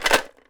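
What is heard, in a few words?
ELM RATTLE, PERC; AKAI S6000 CD-ROM - WorldSounds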